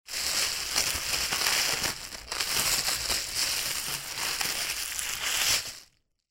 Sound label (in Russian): Paketom shurshat